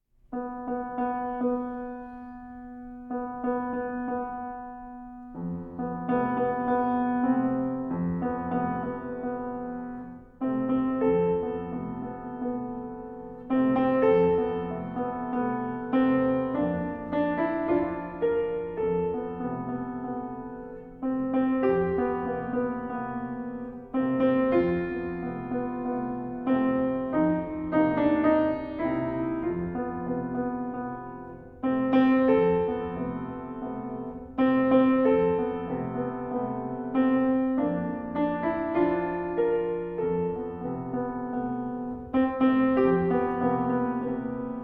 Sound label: Little Lamb Piano Accompaniment